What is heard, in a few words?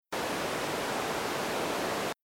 Synth Filter Band Pass